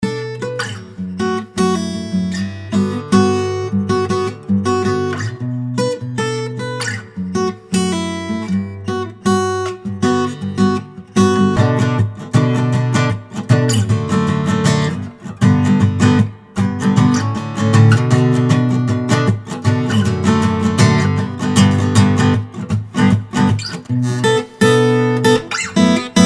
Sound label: Raw Guitar Riffs